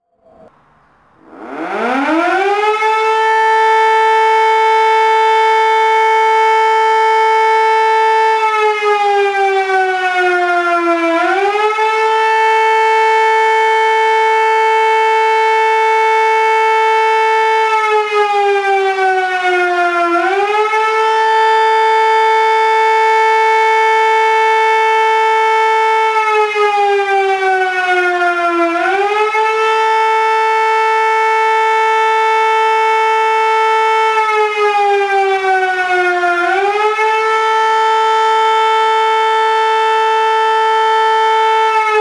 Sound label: siren